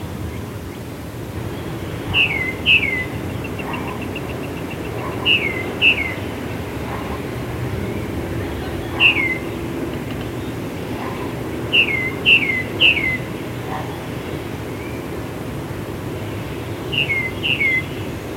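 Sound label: Tufted Titmouse Peter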